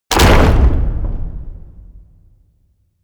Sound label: hit wood, hits impacts